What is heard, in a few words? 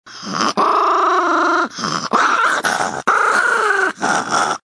Small Dog Growling